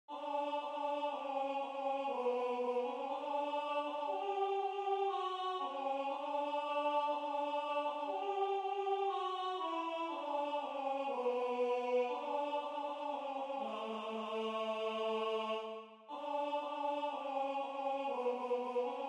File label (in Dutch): heilig de god van alle leven alt